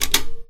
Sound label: menu drop